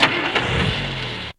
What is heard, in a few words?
car fx; Zero G - Man Machine